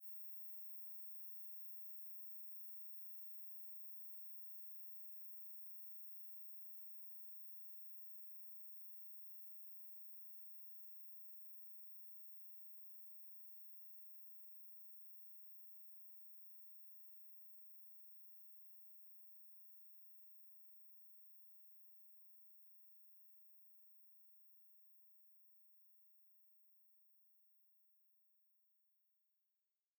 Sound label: arachidonic acid fade 96k